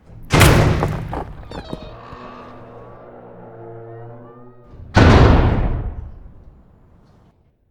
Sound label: door unhinge; Apotheorasis